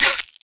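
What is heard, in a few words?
Lips Damage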